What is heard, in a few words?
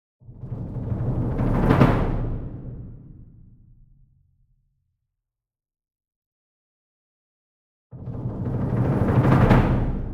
SINGLE DRUM Verdi 66 Inch Roll Soft Beater Medium; boom library; Cinematic Strikes Construction Kit